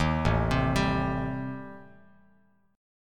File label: A-Minor 7th Flat 5th, Bass Standard, chord; 11,0,10,12; Am7b5 arpeggiated; JGuitar